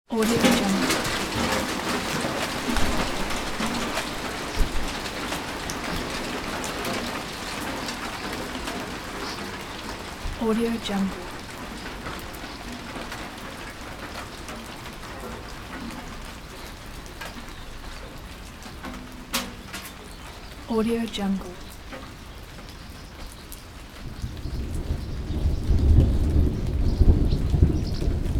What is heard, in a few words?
hailstorm ending; تمام شدن بارش تگرگ; nature sounds